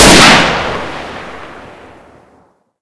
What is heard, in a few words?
rifle fire